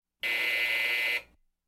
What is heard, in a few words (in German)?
Im Buero Summer einer Sprechanlage